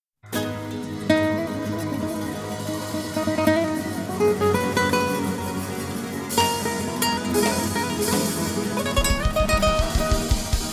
Tango

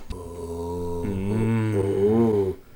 b-down complete with fart and ooOOoooo; Reaper Projects

